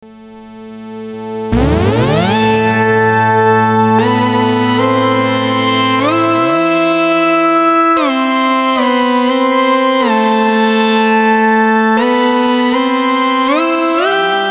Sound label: octave lead, sh-2